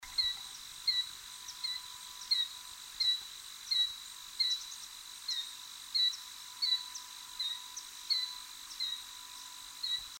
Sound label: Killdeer, Charadrius vociferus; shorebirds rails